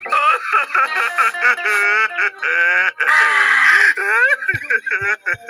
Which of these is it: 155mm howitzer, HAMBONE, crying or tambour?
crying